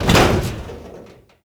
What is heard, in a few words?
FW-190D9 Gear Lock L; DCS